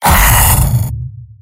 pain sharp; soldier

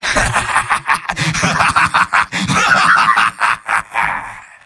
Engineer laugh long

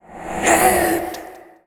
Sword hit; Demoman; "Head!"

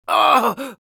pain severe, es; Demoman

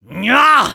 Medic negative vocalization, fr

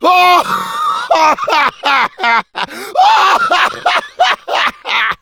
Demoman laugh long, fr; (Rire gras 1); Réponses du Demoman

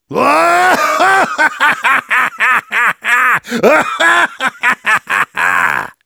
Heavy laugh long, de

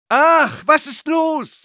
medic jeers, de

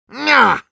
Medic negative vocalization, ru; «Мья!»

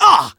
pain sharp; Scout

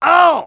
Spy taunt head exert; Аууу!

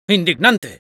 Spy negative vocalization, es; ¡ Indignante!

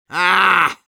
Medic negative vocalization, ru; Аааа.

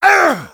exert; Taunt demo

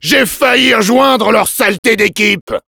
demoman jeers, fr; J’ai failli rejoindre leur saleté d’équipe !; Réponses du Demoman

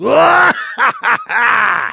laugh happy, de; Heavy

